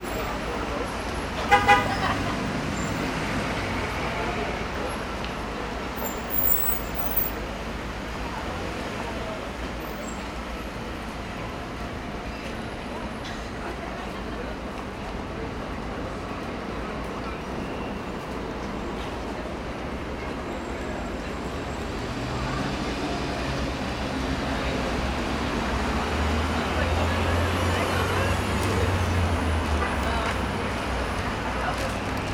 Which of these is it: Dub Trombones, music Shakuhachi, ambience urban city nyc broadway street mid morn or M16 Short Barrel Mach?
ambience urban city nyc broadway street mid morn